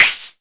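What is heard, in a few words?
mbrute snare, Arturia MiniBrute; DRUM MACHINES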